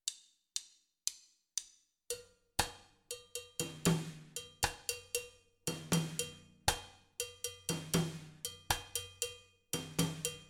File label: basic afrocuban feel mambo